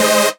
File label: Synths Root C